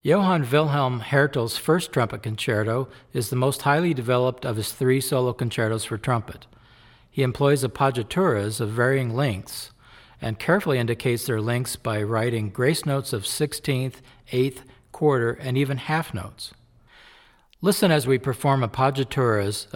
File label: Concerto in E-flat Major, Classical Trumpet; Masterclass Series - Classical Trumpet